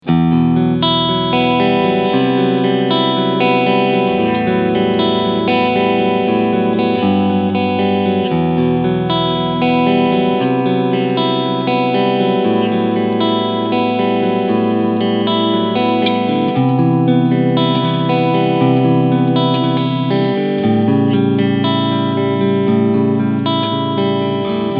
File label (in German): Beide PUs Clean SC